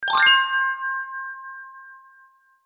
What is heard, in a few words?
pickup